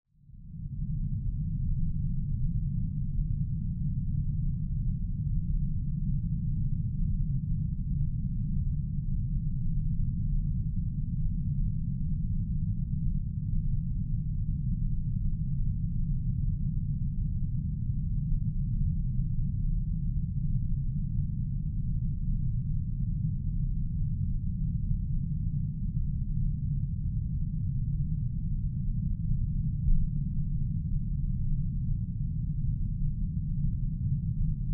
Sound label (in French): Contact Mic Roulement; Petite Ceinture